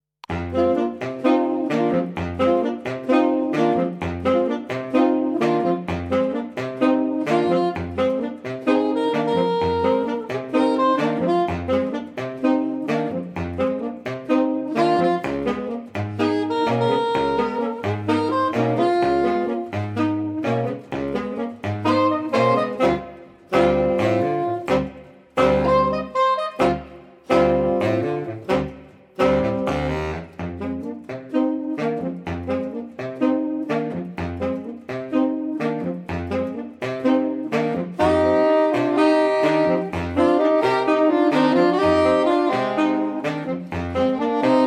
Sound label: Saxophone Quartet; London